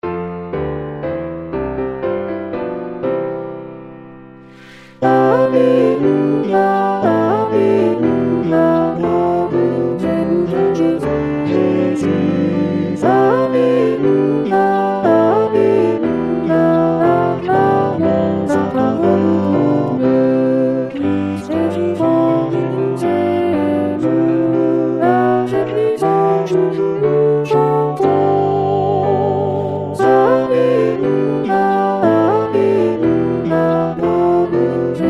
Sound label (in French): Alleluia 5 Voix Piano; Bonne Nouvelle De Jesus